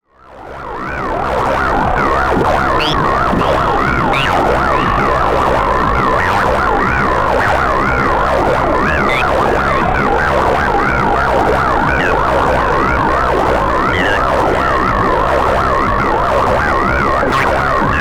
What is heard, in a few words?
Xaoc Devices Leibniz Binary Subsystem Wavetable Bank; XAOC Ting